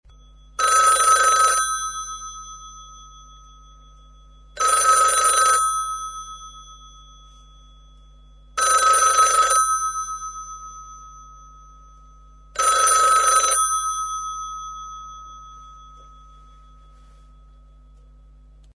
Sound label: Belgium Bell phone